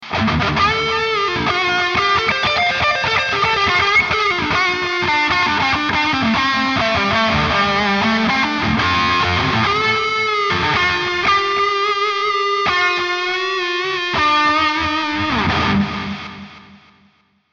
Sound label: big lead